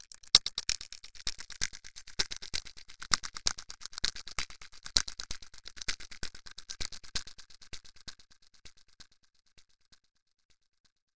Plastic Dice Toy Rubbing Sci Fi with Echo Tail

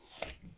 eat

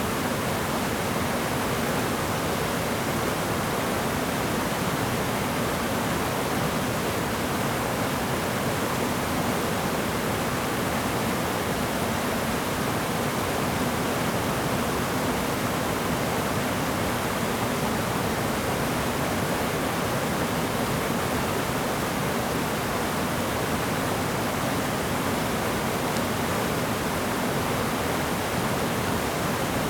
Ambiance Waterfall Strong Loop Stereo; Nature; Essentials Series NOX SOUND

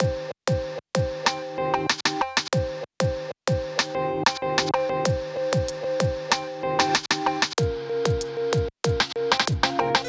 Chunky Chops, Loops; BB 2HTC Samples Vol 4

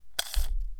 Measuring Tape Pull out

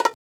Bongo item error; FREE SFX PACK VOL 2

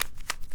Big Cube - Twist, Rubik's Cube

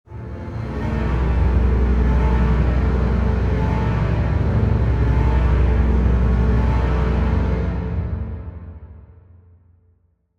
Chase Strings Low, Horror Strings

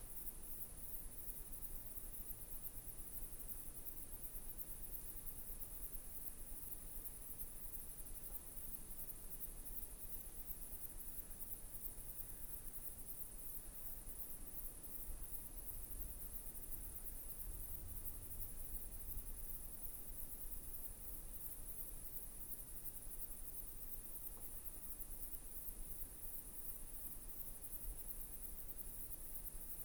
Ambiance Night Loop Stereo; Nature; Essentials Series NOX SOUND

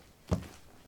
step; warfork

